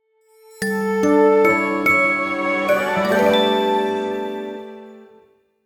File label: Sleep - Save Jingle